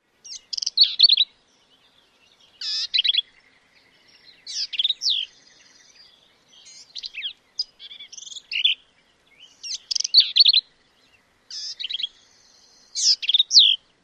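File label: song, Black-capped Vireo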